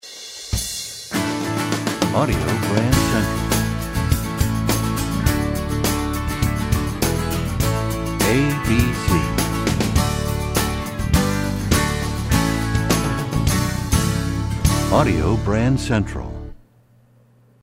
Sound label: Desert Wind, radio jingles